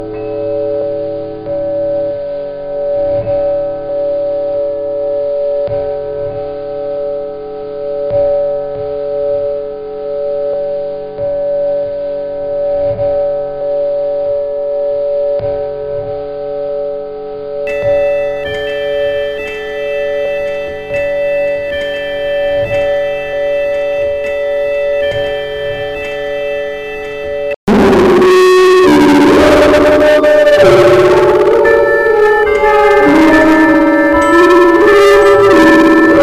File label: bells